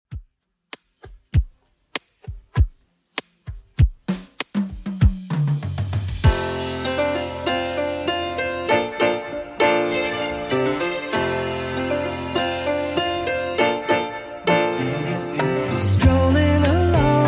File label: 70s Redrum 98bpm